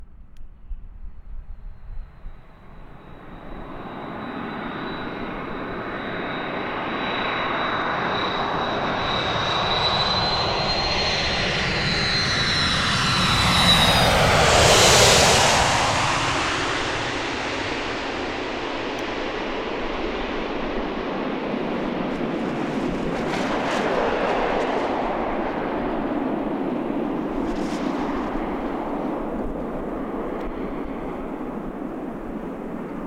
Closing Wing Vortices

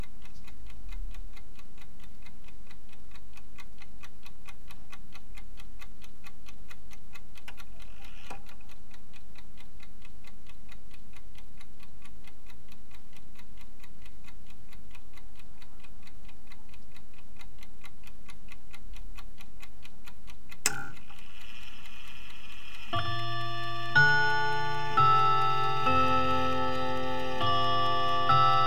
Big ben 5 Uhr, Clock My Grandmas Clock

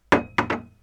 Putting Pot On Table

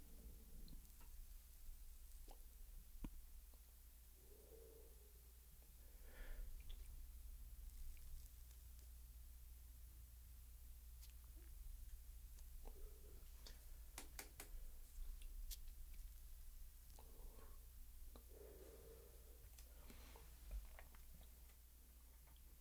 Cigarette Burning

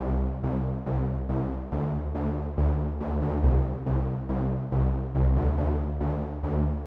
Dark Intro

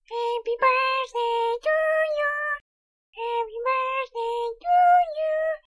Sound Birthday

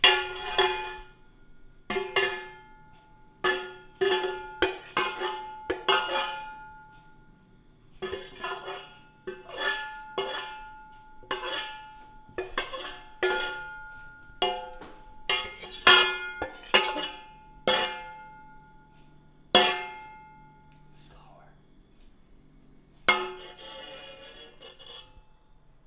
Steel Sauce Pan And Whisk

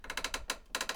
Creak Wood Floor

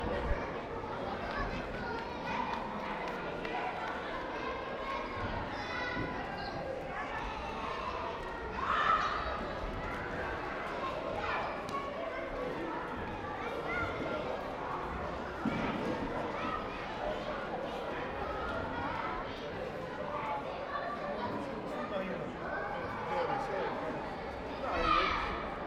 Playground Indoor Kids

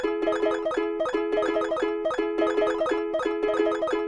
Pseudo Video Game

